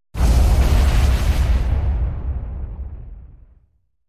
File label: Explosion Ship Medium